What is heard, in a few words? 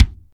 clicky kick drum single hit a sharp key